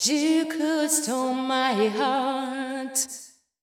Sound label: VOICE GRL HOUSE 125 A, House Voice